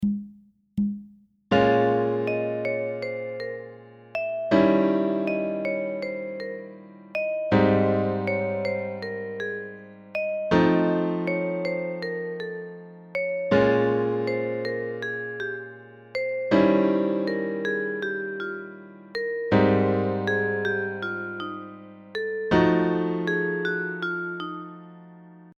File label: II V I VI, b9 on dom.(Ab)